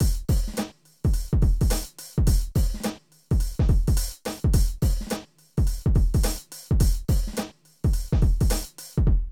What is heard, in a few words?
Drum loops, Hip-hop; Zero-G Groove Construction (1993)